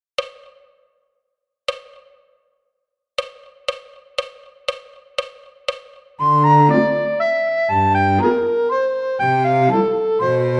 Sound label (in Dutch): Nokia alt; Tureluurs 2